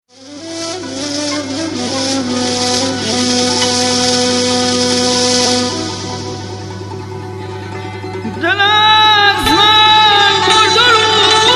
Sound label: awaz dashti